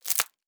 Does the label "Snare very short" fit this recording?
No